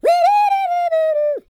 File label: TRUM, Jazz